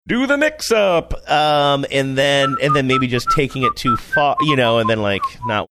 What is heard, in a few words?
saying do the mix up whistling